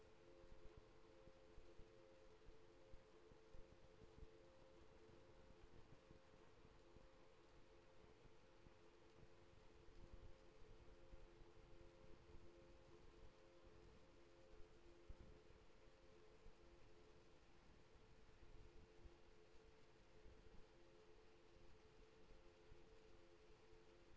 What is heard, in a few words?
HYDROPHONE 16000Hz; JASCO AMAR HYDROPHONE 740